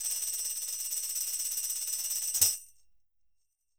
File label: Roland MV8800 percussion